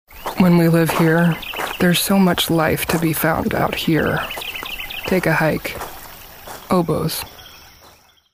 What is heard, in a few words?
oboes